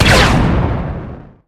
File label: heavy blaster cannon